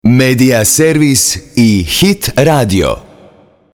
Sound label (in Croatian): Jingle Vijesti; Media Servisa